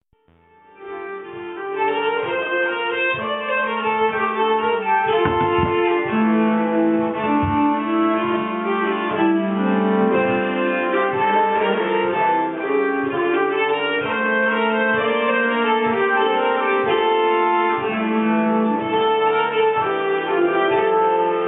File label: polska, nyckelharpa; polska Blomgren